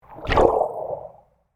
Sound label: Underwater Pass By, Water, Underwater, Movement, Pass, By, Hydrophone; Water Sound Effects; BlastWave